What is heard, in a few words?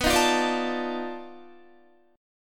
B 7th Sharp 9th Flat 5th, down, Guitar; B7#9b5 strummed; JGuitar